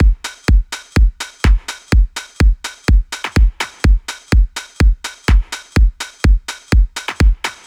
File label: Drum Loops 125bpm